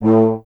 tuba; Miroslav Vitous - Solo Instruments VOL-2

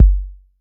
BD 808

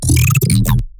glitch stab; Virtual Riot; Glitch Stabs

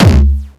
Jump kick, Jump hardstyle kick

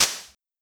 Clap, Fricke MFB 502

